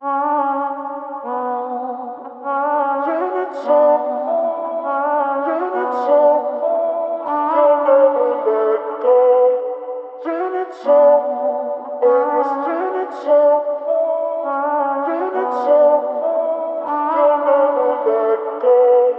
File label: vocal chop, 100, Bm; Heaven; Slate Digital Omnivox Vocal Sample Pack; Chops